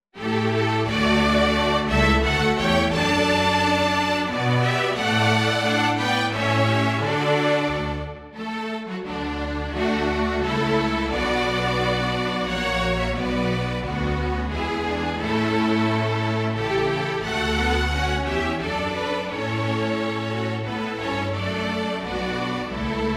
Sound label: String Orchestra; STAR SPANGLED BANNER